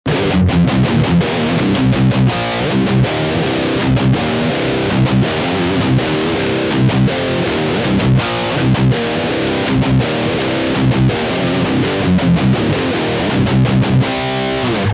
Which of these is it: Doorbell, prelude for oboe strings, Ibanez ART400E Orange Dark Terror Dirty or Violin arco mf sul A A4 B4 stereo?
Ibanez ART400E Orange Dark Terror Dirty